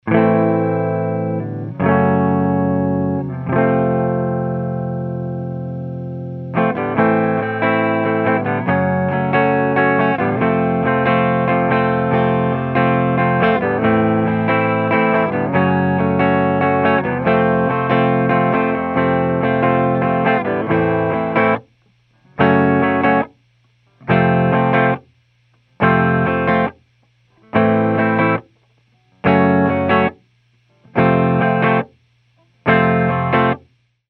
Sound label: AMP Clean V2, clean eguitar